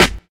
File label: Treble-Heavy Snare Sound G Key, snare sample tuned to the G note